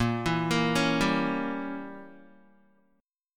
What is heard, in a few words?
Bb Diminished 7th Bb down Guitar; arpeggiated; 4E,4A,5D,5G,5C,6F; chord shape